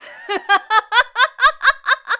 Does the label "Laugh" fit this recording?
Yes